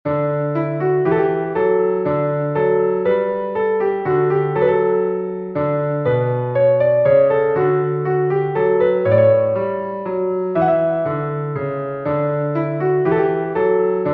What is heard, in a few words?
Minuet in D major